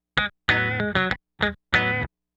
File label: Guitar, 70s Analog Funk